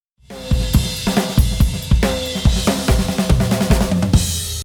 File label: drums